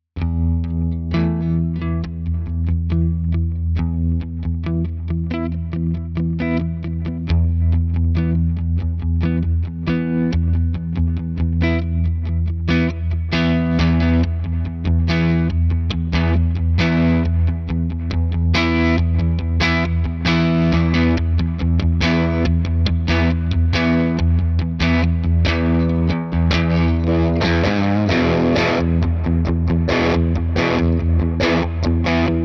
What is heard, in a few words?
Morgan Amps Overdriven Tremolo PR12